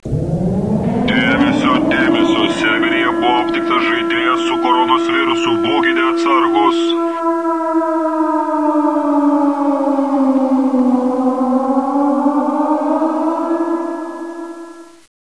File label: Corona Virus Aliarm